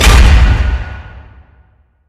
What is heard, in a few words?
impact; Smite; Vulcan